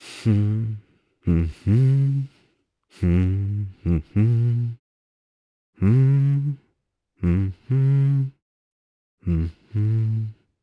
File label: Vox Hum, jp; voices heroes jp; Dimael; kingsraid